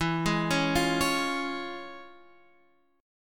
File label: C sharp Major E; C#/E Chord {X 7 6 6 6 9} arpeggiated